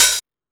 Techno G, Drum Machines, EMU XL-7

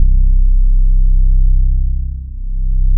C1, Bass; E-MU Producer Series Vol. 6 – Analogia Project (CD 1); Boom da Bass 3

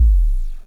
Drums, Hits; DCM8

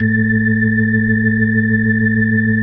A2F, B3

